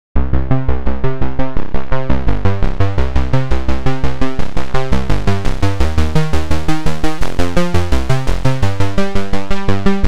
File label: BASS SEQ